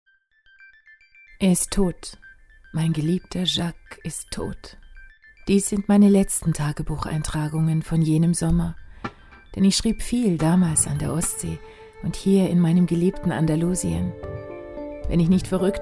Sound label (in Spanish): flamenco blues auszug